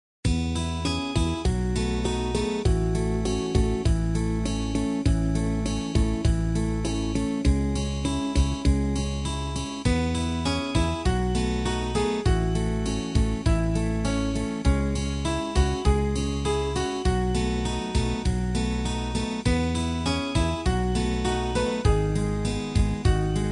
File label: takeda lullaby instrumental